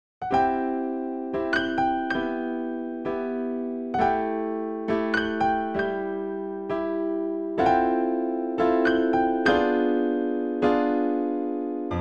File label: piano